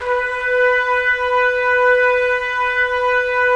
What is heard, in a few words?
Trumpets; Roland - LA Composer vol. 4